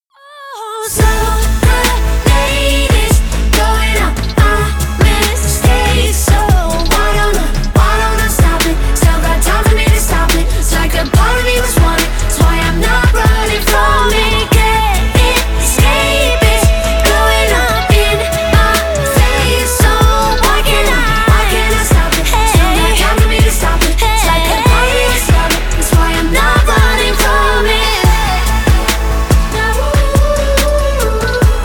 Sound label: slow, ringtone